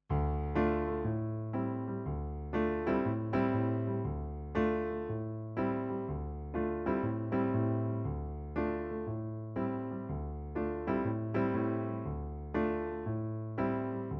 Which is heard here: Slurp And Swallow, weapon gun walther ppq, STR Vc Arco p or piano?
piano